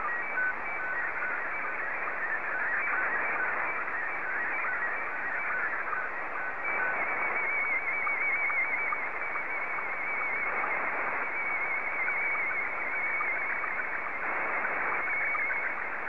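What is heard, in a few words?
SSTV, Scottie 1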